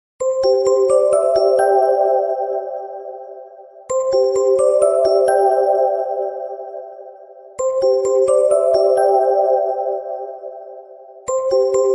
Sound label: digital bell sound musical tone ringtone